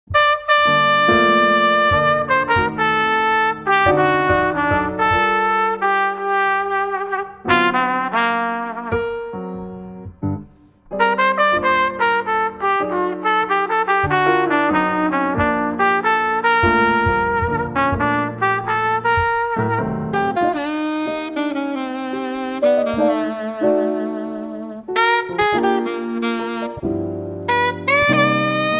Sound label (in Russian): Dzhazovoe trio